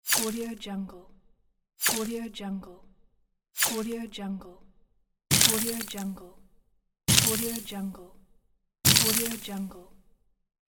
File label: magical ice attack; creatorium-sound-design